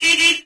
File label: travel default sound; windows 9x; sound schemes